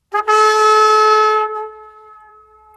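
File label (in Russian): юное эхо; zvuki gorna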